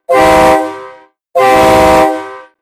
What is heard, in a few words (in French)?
klaxon train usa 3 trompes chromees 130 135 db